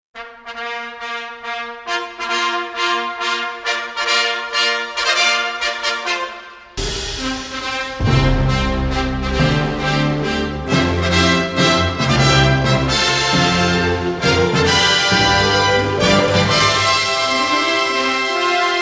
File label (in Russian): Фанф Торжественно духовые